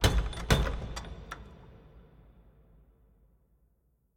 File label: knock